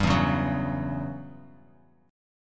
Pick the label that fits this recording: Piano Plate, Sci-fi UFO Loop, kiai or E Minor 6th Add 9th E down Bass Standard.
E Minor 6th Add 9th E down Bass Standard